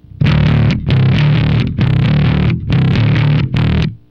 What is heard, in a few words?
Bass; pitchshifter PSI